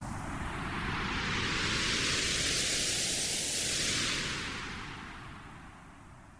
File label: ufo, swish